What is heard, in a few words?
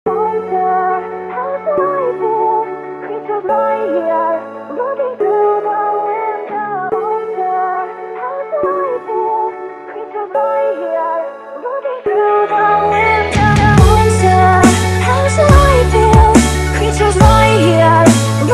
dubstep remix